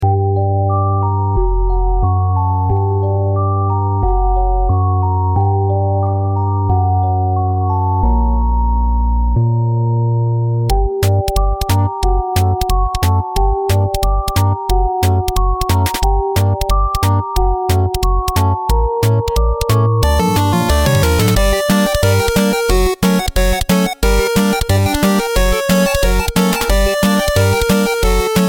halloween spooky; freepixel; Seasonal

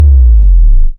big impact; Sfx; freepixel; Tilter

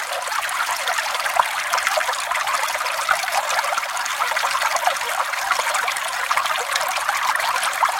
river flowing; Nature; weather

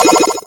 door locked; Environment

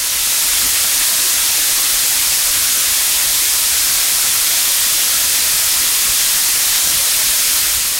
waterfall; Nature

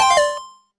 trade complete; Multiplayer

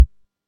wall jump; Movement

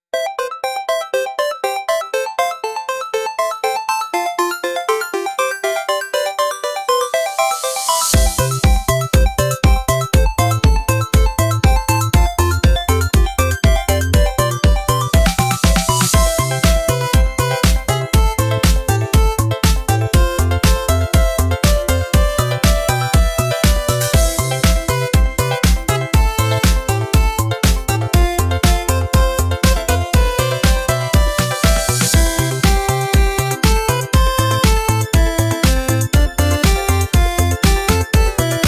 enchanted forest; freepixel; Biomes

